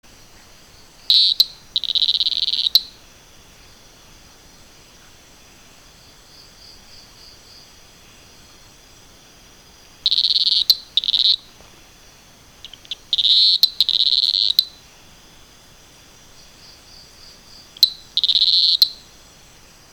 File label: Leptophryne borbonica